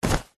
clothing jeans denim pants trousers catch; zapsplat

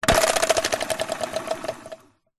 leisure toy plastic spinning drum spin

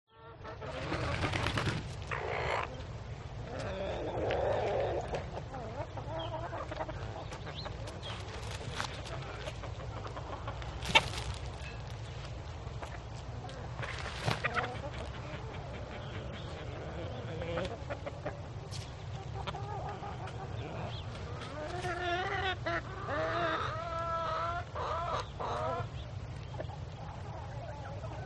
Birds Chickens Cackl; audio hero

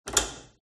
knob clothes dryer; fox audio

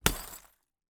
impact glass bottle hit break with hammer

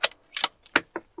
gun tikka t3 battue 308 Swpph Reload

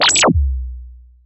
Zap Laser Blast; bottlerocket

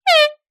sport air horn 1x blast; zapsplat

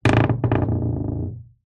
household door creak wooden dull

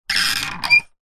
wood handle turn creak; foley

